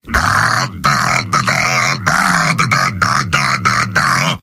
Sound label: cheers; heavy; video games; source engine; tf; mvm